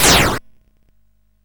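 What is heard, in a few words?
Nebulus, MOS 8580 SID chip music, games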